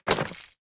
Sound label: glass